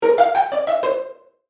Multimedia Chime, Technology, Multimedia, Chime

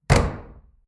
Internal Wooden Door Old Closed Solid Shut; Miscellaneous Door

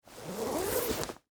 Winter Coat Zipper, Clothes, Down, Slow; Foley; Dressing Sound Effects

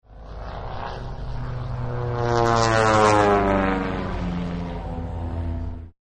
Dive Bomb, battlefield; Field Fire; War; Partners In Rhyme

